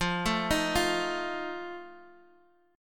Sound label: F 7th F down Guitar; arpeggiated